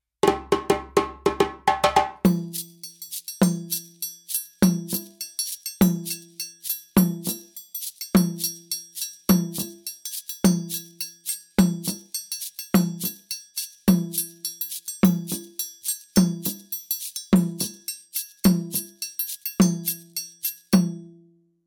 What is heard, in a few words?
fanga sangban; rhythms